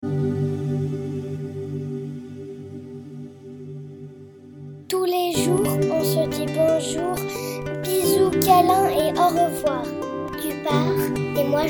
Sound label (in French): karaoke sans refrain